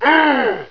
pain grunt

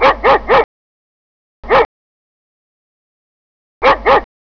Dog Barking Long

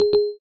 cancel

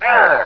pain grunt

